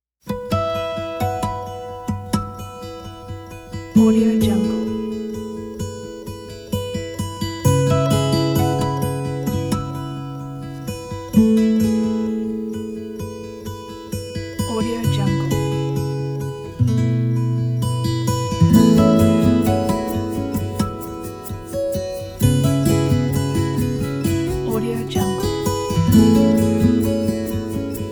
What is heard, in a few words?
ambient acoustic guitar beautiful peaceful